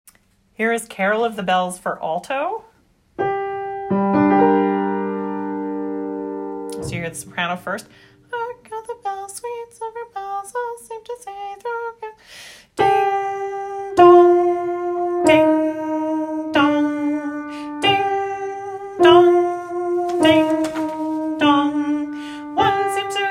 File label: alto; carol of bells